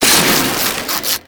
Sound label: Debris Fabric; Ambience; Gameplay; Warframe; Entrati